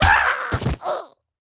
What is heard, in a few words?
C Merling Dead